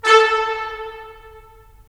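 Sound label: brass trumpets staccato; Roland LA Composer vol. 4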